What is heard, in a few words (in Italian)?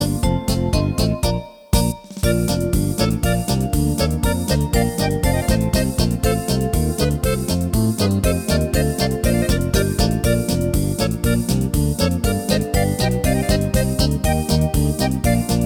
fisarmonica impazzita polka